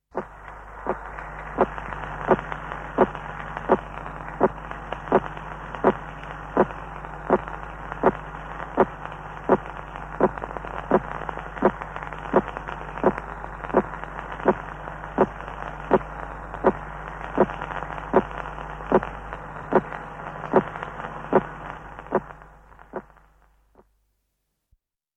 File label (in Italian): TERGICRISTALLI